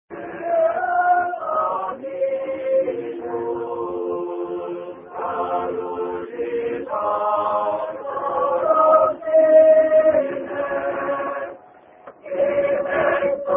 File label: London Choir